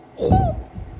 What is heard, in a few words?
gnome sound